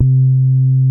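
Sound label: SUPPORT BASE, BASSES; Masterbits; Vintage Voltage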